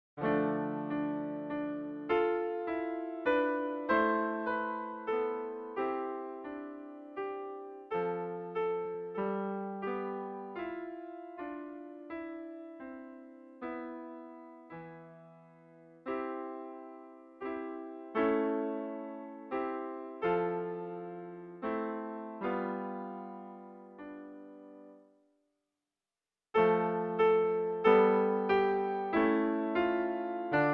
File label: Choral, pianoforte; libri di pianoforte per i corsi di base